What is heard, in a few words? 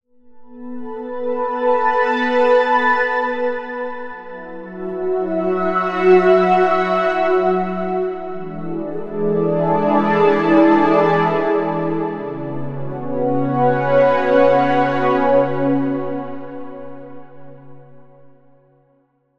GForce VSM IV Synth Strings Juno106 Ob8